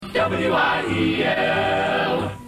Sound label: shout